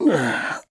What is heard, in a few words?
Rough Landing Awaken; awakening from the rough landing; Groose; Skyward Sword